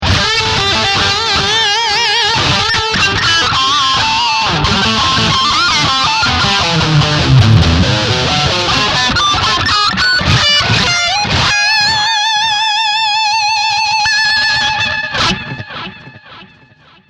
Bridge Humbucker Lead

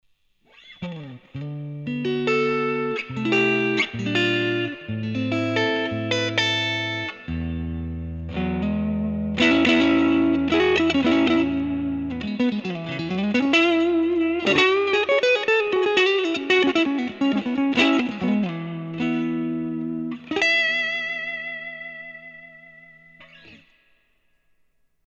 Fender Blues Hall; LTD

